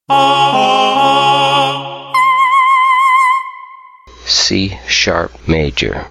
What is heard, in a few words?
C sharp major key note C4 voice; key note recognition voice ear training